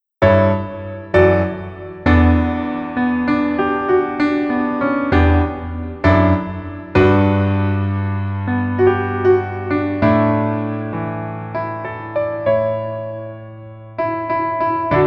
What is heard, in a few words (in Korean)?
Piano ver 멜로디MR